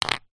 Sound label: board game plastic house or hotel set down on playing board; monopoly